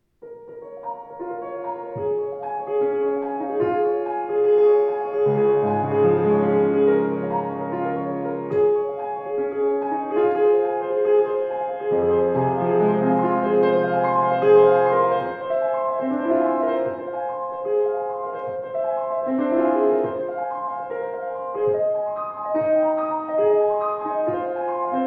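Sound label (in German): Yamaha C3, Flügel; 186cm c3 fluegel 2003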